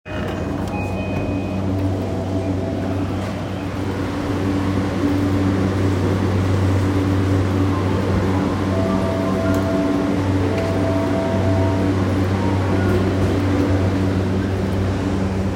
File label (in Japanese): JR横須賀線新川崎北